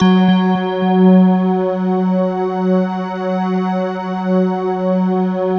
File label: BELL C, Slow Bell Choir